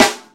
studio trap snare single hit g sharp key, snare sound tuned to the G# note; snare drum samples